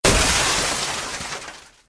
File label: explosion; Formula Drag